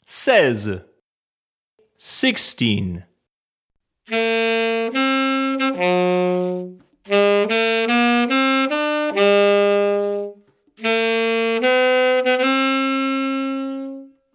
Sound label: Alto Saxophone